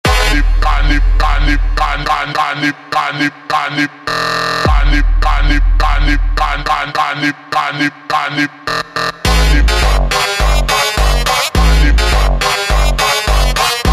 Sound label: Phonk super Slowed super Slowed